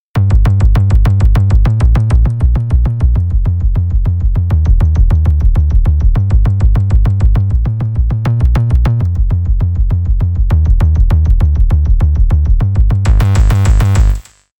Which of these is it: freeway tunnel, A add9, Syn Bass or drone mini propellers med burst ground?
Syn Bass